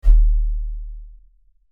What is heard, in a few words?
Clean Bass From The Bottle